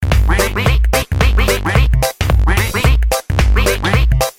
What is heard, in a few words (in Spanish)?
pato; pocoyo